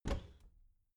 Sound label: bathroom cabinet doors open heavy; objekte; tueren